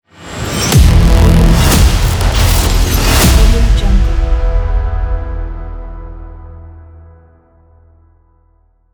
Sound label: glitch hybrid logo